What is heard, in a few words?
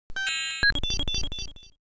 alarm clock